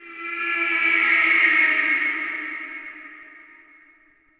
undead; DAoC

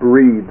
breathe

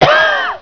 cough; Exile v1.1.3

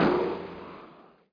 Bolt hit; machines

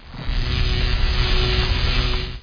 BOLT; lands

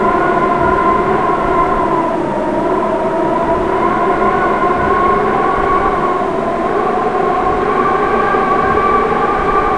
cold wind; EARTH

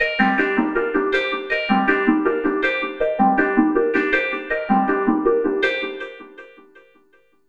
synth, ambient, 160, C; Keys; eJay

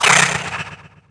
neriad attack; DAoC; Toa; Gamestar

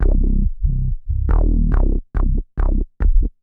bass B; Techno; TechnoMusicMaker

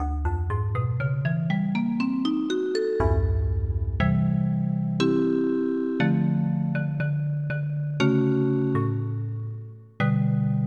Marimba; EverQuest

